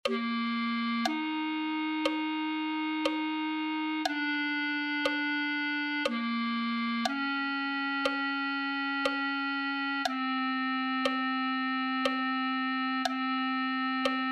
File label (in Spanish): purifica oh dios clarinete